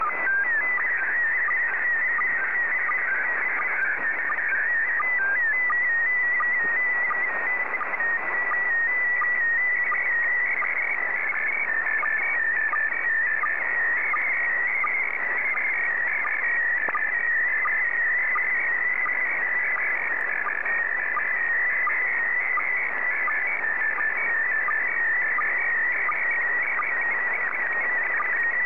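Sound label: PD90, slow-scan television; ZL2TJM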